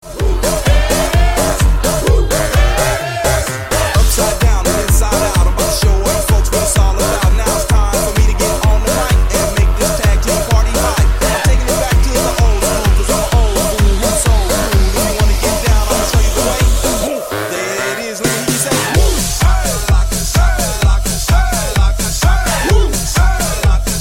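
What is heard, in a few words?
rattle remix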